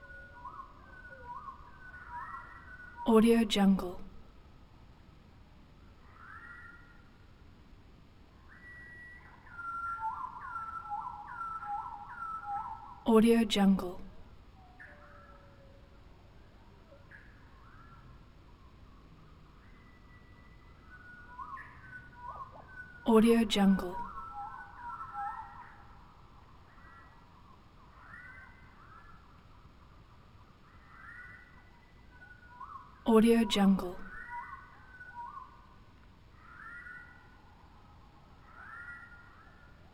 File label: birds, sonoma